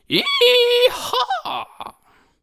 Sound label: Tank Attack Vocals